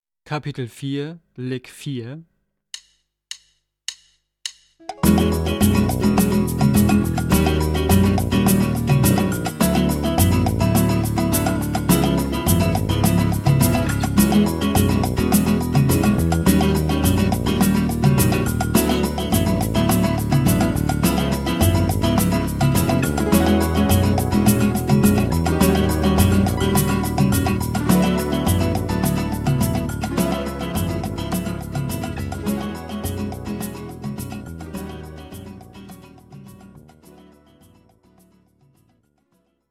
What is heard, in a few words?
Latin Style Guitar